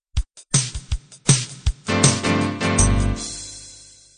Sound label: 60s pop intro